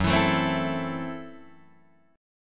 Gb Minor 7th Flat 5th, Guitar Drop D

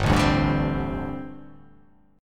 G Suspended 2nd Suspended 4th down; Gsus2sus4 strummed; JGuitar

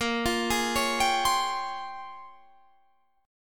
Bb Minor 13th chord; arpeggiated; JGuitar

